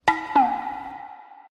anime horror

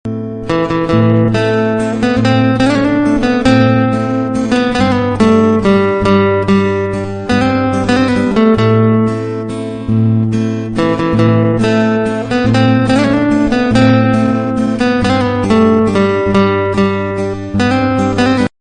guitar sound notification tone

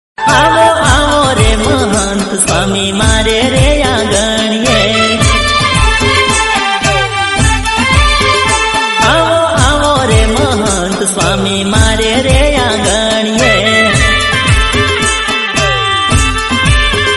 gujarati; mahant swami; timali

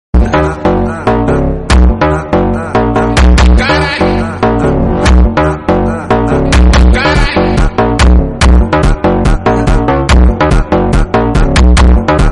funk background music

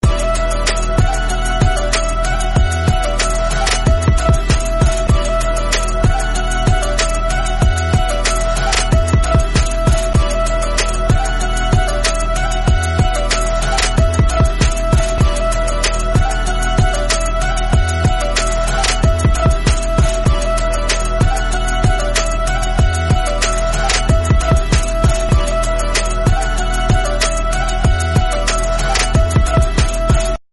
instrumental song

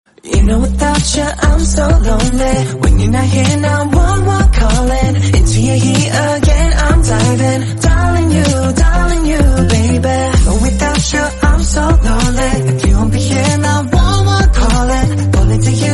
korean song

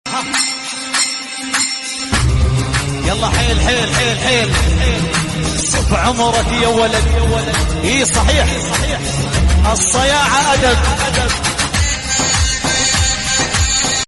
yalla hail hail hail arabic

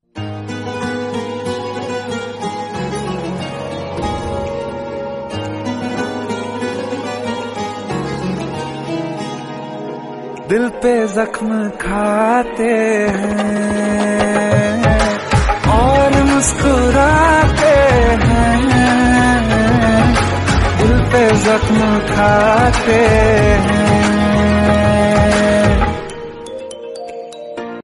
hindi, sad

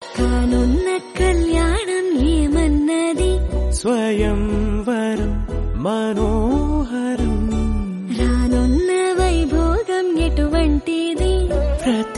telugu song; sita ramam

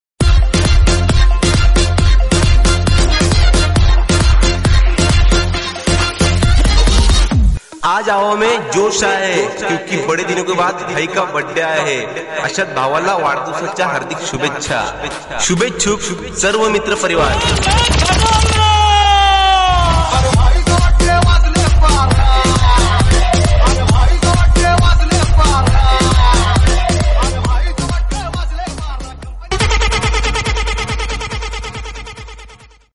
happy birthday english song

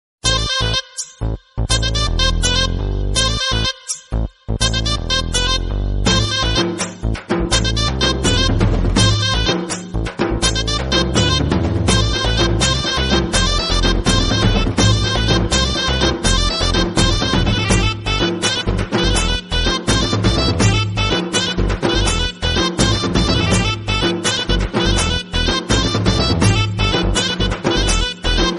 remix mass bgm telugu

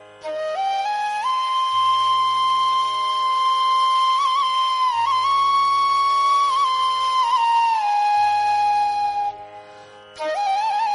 flute; buddha serial

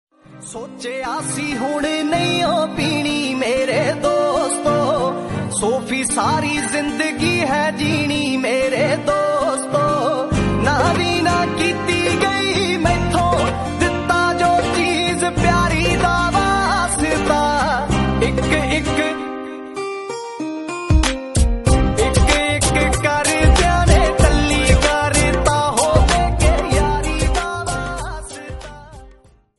punjabi

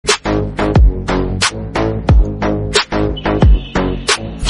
background music

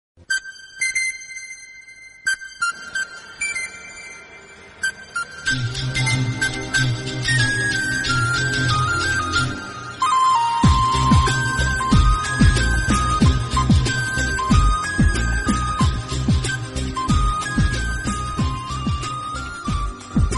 flute indian tamil

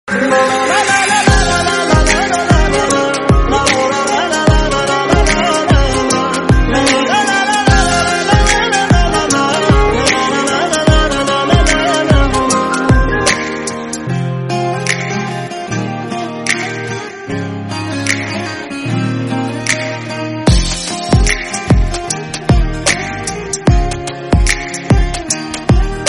punjabi song

